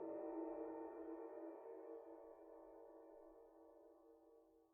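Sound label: suspended cymbal roll mp release; Idiophones; Struck Idiophones; Suspended Cymbal 1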